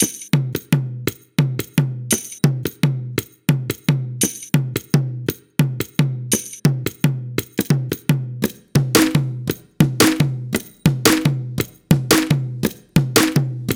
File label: folk